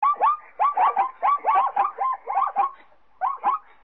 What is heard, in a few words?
Zebra